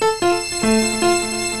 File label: piano